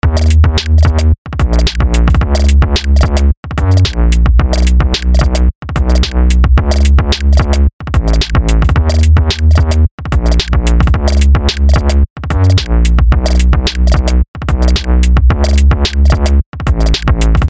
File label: loop only; ddnet